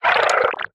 wriggler idle; enemies; Factorio

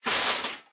recycler trash; Factorio

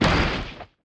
asteroid collision carbonic small; Factorio

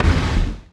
asteroid collision interstellar small; Factorio

